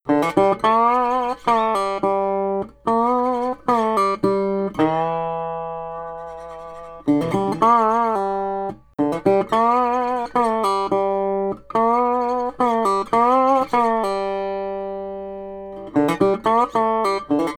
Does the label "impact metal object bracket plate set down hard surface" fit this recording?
No